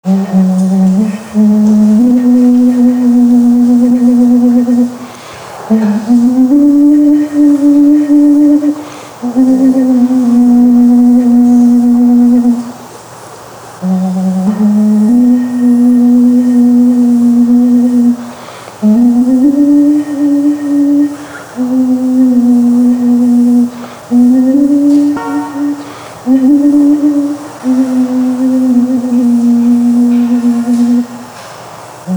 Flute

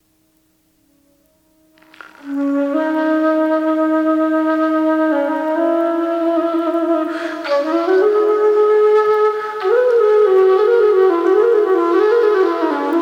Western Flute; Nurnberg

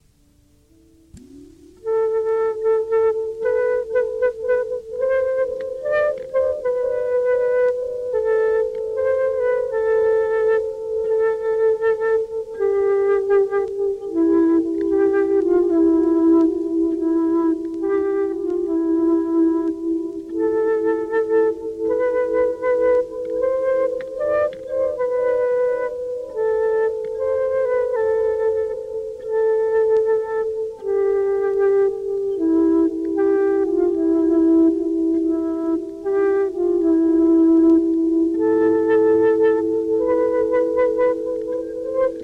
flute arrangements

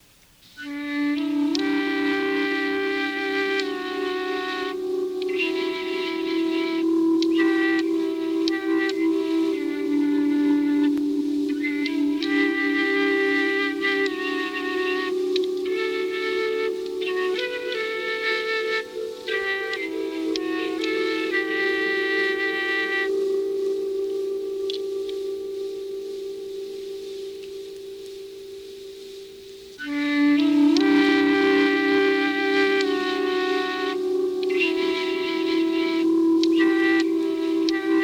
Flute

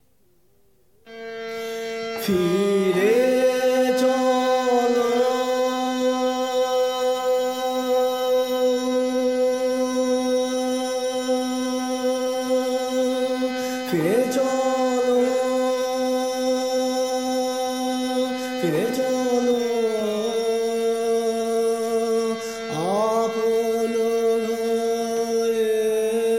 singing with harmonium

